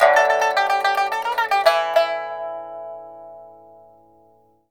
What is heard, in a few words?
PIPA PHRASES; Spectrasonics; Heart of Asia